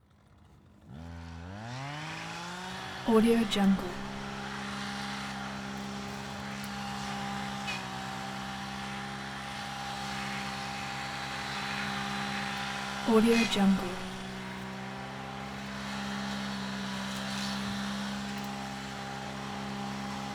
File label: gardening strimmer heavy duty